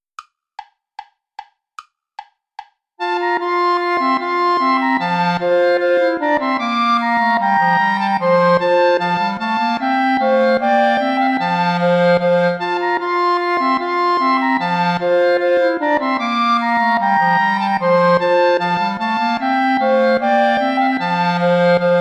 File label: bourree play along without clarinet; praetorius michael; bourree iii